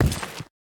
Monster; Zenless Zone Zero

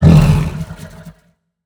Monster; Zenless Zone Zero

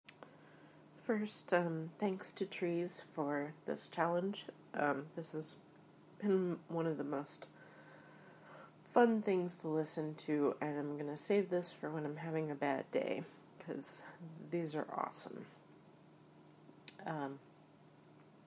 Evil laff

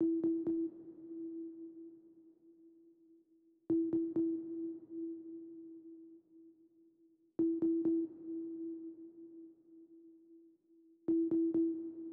intro pluck thing

